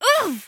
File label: tracer pain; tf